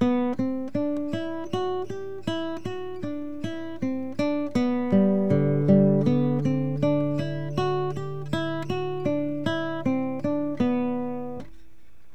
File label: theme variation harmony